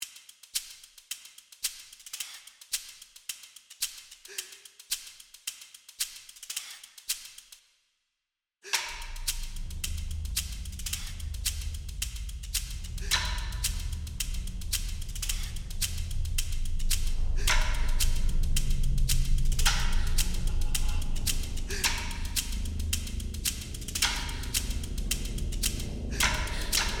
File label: Horror